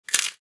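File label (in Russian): короткий звук трещины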